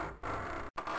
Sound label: mech creak; hero-mark-2